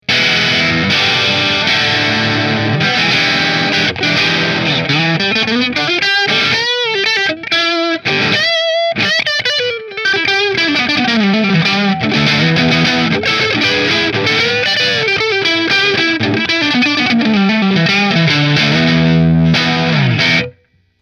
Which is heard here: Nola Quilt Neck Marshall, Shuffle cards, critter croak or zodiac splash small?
Nola Quilt Neck Marshall